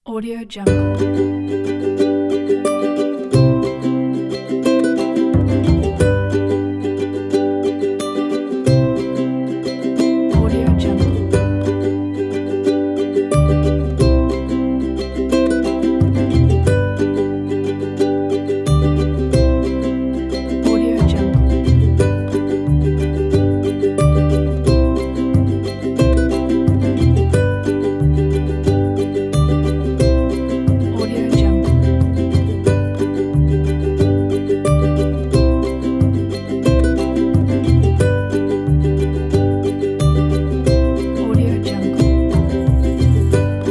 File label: happy ukulele